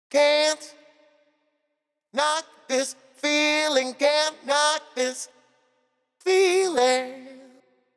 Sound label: Octavised Reverb DOWN VOX